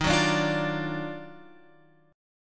D sharp Minor Major 9th D sharp, Guitar

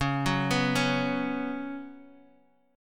B Suspended 2nd Db

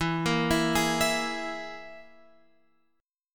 E Major Flat 5th

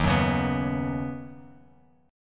Eb Minor 7th Sharp 5th Eb Bass Standard; strummed

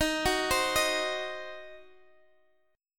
C Diminished D sharp, chord

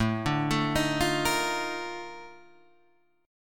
Bb Major 11th A

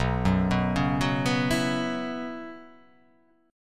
B Suspended 2nd Suspended 4th down; arpeggiated; JGuitar

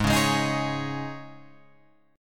Ab Suspended 4th, Guitar Open F; Absus4 strummed; JGuitar

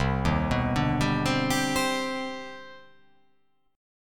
C Minor Major 9th C

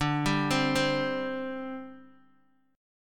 D 7th Suspended 4th, down, Guitar, Open D

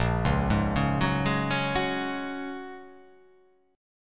A sharp Minor 7th Sharp 5th, down

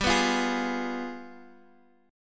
G 7th Flat 9th

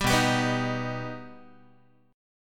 B Augmented B Guitar, chord; strummed; JGuitar

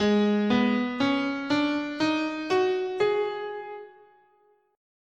Blues, scale, guitar; Gsharp

